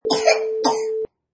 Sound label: Child Cough